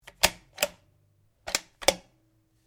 RIFLE CZ 452 VARMINT 22 CALIBRE BOLT ACTION SEQUENCE; A selection of domesttic firearms; Rifles